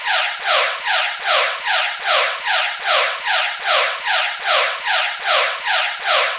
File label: Hype 150; embers; Flightfirst